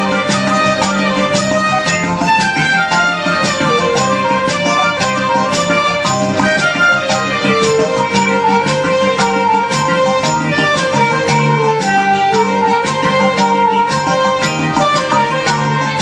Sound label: Electro Guitar